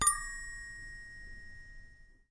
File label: clear bell pling; Cymbals; single hits